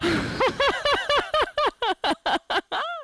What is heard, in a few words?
Long duration song like female